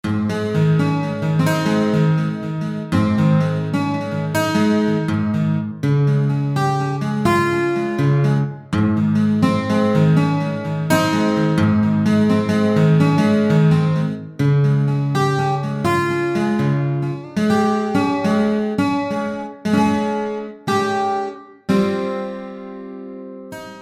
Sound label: zurna; micro